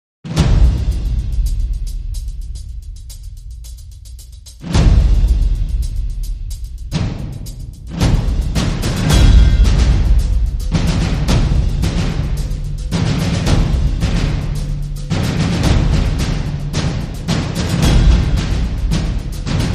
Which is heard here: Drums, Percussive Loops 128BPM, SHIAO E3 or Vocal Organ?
Drums